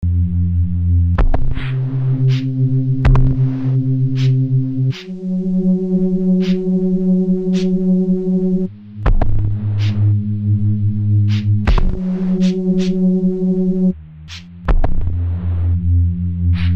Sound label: intro book sound; nelson; dreamaphage